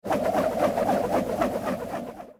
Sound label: Boomerang flying whoosh; cartoon